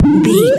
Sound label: retro jump bounce